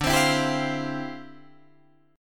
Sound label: D Minor 11th D down Guitar Drop D